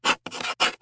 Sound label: dice cheat scratch; burns casino